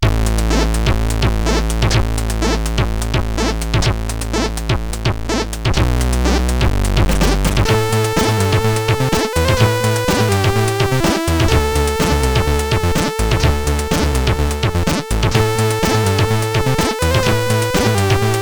sid, CSG8580R5

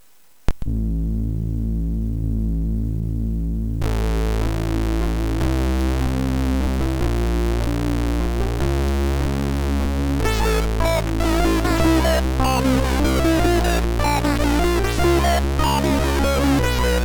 Slow Rock

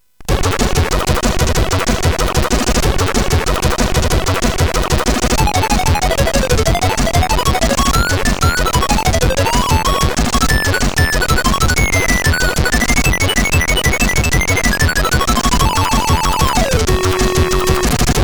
Bill Bass, CSG8580; CSG8580R5; hvsc

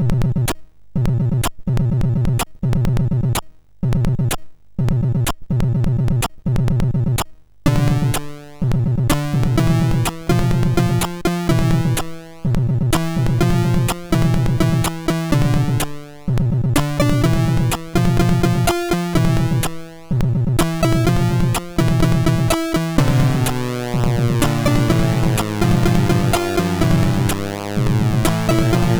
sid MOS6581R3; Empty; Dexter

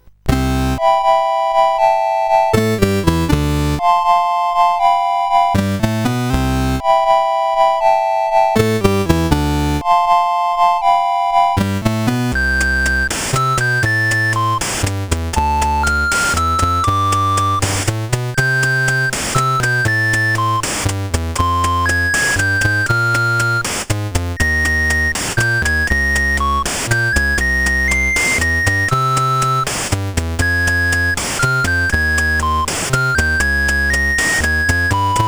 Ballad sid CSG8580R5

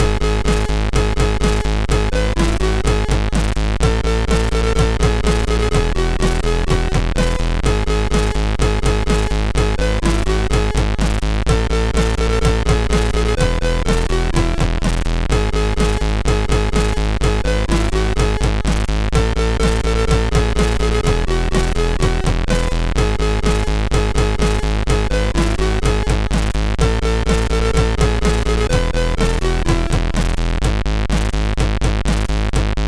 Xmas Rock, sid MOS6581R3

